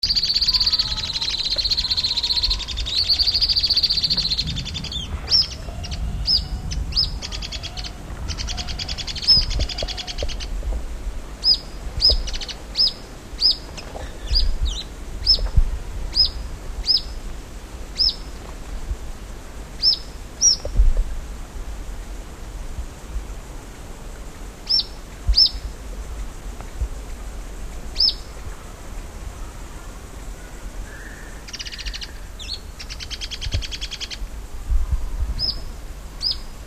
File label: Myiopagis caniceps